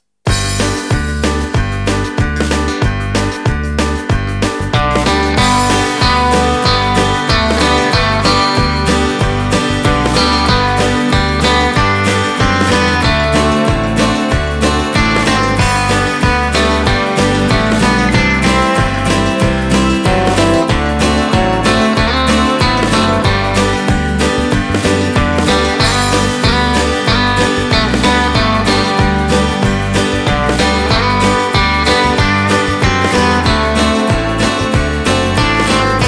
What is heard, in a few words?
Red River Valley listening